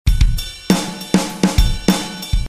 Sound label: drums